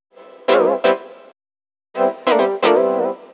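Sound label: synth, Roots Funk; USB Soundscan vol.41; AKAI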